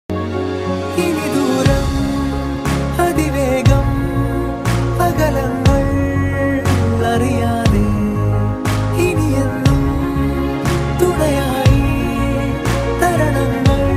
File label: malayalam song ringtone